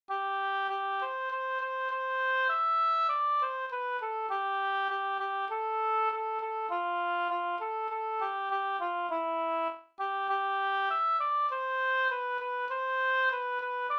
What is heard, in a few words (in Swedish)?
skanklat; Tackvisa fr Sd